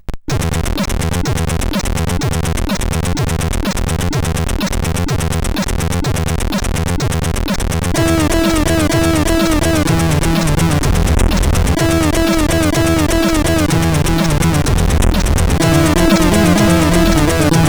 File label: sid CSG8580R5, Chiptune; Syntax Error